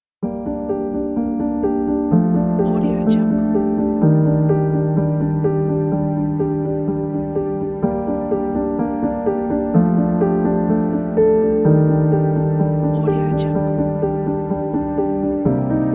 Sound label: sad piano